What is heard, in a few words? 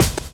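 drum chop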